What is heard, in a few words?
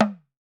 TAM TAM, African Drums; E-MU Formula 4000 Series Vol. 4; Earth Tones